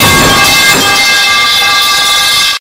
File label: metal pipe; beamng shorts version